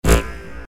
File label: electric sound